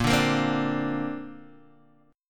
Bb Major Add 9th, Guitar, Open D

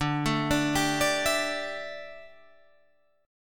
D Suspended 2nd Suspended 4th, Guitar, Open G

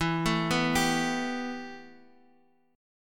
B 7th Suspended 4th Sharp 5th, E

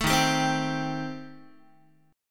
Eb 6th Flat 5th, Guitar; Eb6b5 strummed; 12,11,10,10,11,x; JGuitar

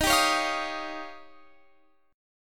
D sharp 9th D sharp, Guitar Open F; D#9; strummed

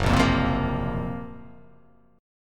Gb Major 7th Flat 5th Gb; strummed; 2,1,3,3,0,0,0,x; JGuitar

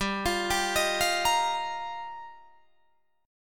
G Minor 7th Sharp 5th, Guitar, Drop D

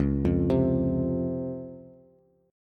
Db Major 7th, Bass; arpeggiated; chord shape; x,4,3,5; JGuitar

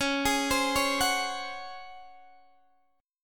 Db Major 7th Suspended 4th, Guitar Open D

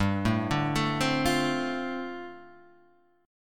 F 6th G, F6/G chord; F6/G arpeggiated

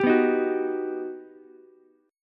G Augmented Major 7th, Ukulele; G+M7 strummed; JGuitar

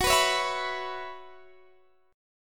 F sharp 7th Suspended 4th, Guitar, Open D

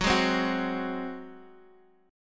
Gb Suspended 2nd Flat 5th, Guitar, Half Step Down

